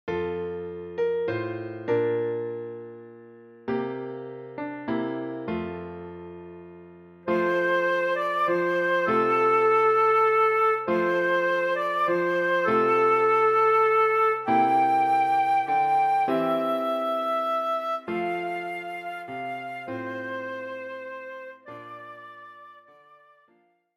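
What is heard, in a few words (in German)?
Stille Nacht heilige Nacht Querflöte, mit Begleitung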